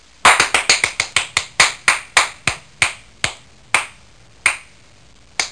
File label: Single Hand Clap, Fast Then Slo